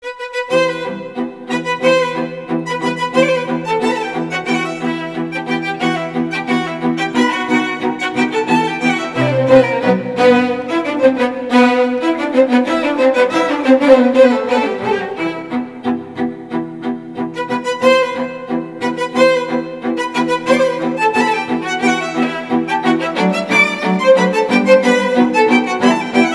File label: Magic Strings